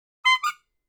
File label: cheerhorn